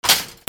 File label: OFFICE